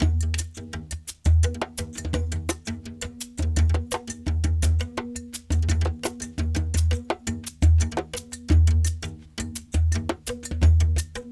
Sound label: Large Darbuka; Berimbau, Bell Shaker